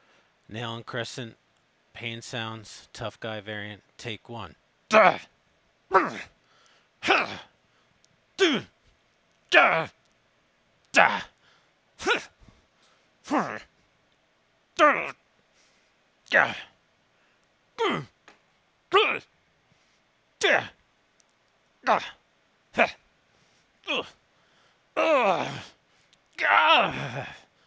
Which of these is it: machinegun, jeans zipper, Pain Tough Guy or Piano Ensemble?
Pain Tough Guy